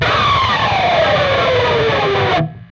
PRS SCRAP, Guitars; PRS SCRAPES

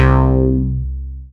RESO BASS, OLD SYNTHS; Rubber Chicken Software Sample Bank

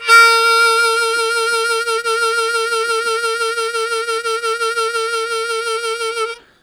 Strong Vib Sustain Bb1, Harmonica; Zero G

